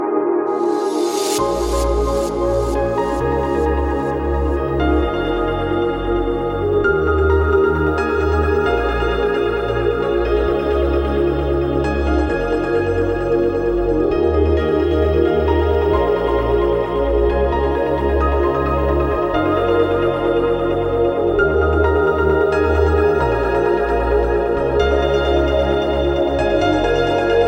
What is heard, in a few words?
ambient piano; meloboom